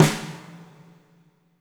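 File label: fat snare right; Spectrasonics; Burning Grooves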